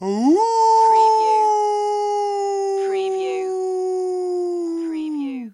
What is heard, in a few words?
Wolf dry